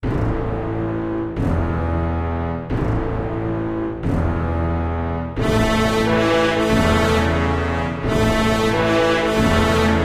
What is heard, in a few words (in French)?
hymne instru barbare; reflets d acide